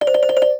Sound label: Shuffle